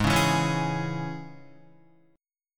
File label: G sharp Suspended 4th Sharp 5th G sharp, Guitar; G#sus4#5; strummed; JGuitar